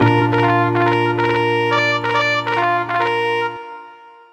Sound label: bugle; Onslaught